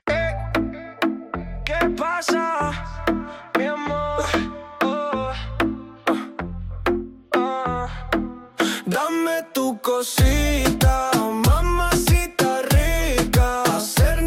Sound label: reggaeton latin; IA VEO 3